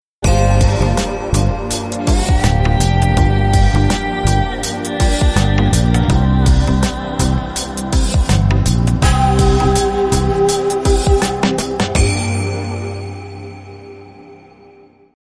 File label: Asian Groove